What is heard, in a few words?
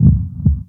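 Heartbeat, Roland MC-303; Drum Samples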